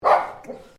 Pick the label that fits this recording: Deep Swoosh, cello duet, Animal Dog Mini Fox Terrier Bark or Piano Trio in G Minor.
Animal Dog Mini Fox Terrier Bark